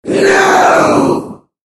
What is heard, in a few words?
death; Dota 2; Clinkz